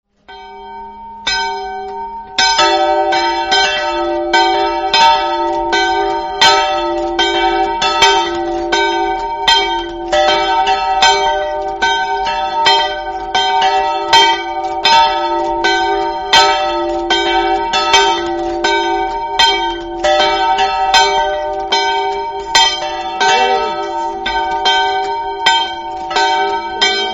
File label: campane; hiarmacis